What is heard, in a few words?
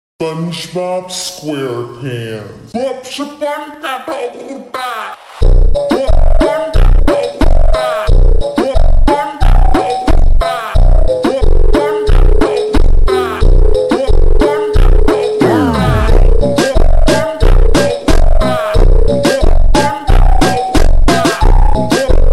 Phonk Super Slowed